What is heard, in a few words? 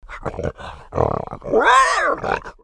Pet Warthog Idle; creatures pets